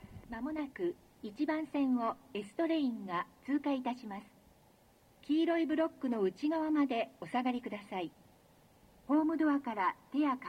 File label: pass train, tokyo metro station; nagatacho